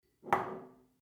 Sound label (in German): Glas faellt um